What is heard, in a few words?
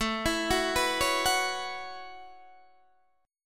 A 6th Add 9th A down Guitar DADGAD